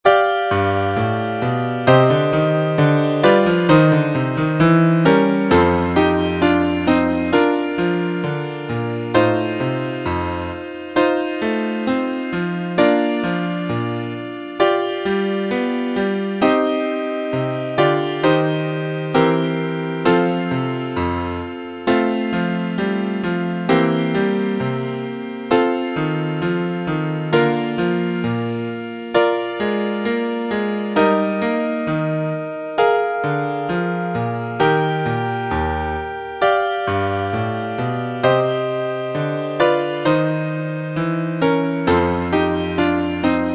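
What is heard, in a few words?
accompaniments, Trumpet in Bb; Vocalise #1; concone; Rudimentary Transposition Studies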